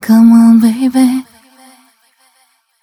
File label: f mel c, fem Vocals; Mutekki Media - Diamond Vocals 2